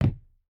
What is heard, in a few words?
footstep rubber boots wood jump land; Rust